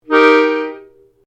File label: PIANO ACCORDIAN G MAJOR SHORT, Old Piano accordian; Pianos; Musical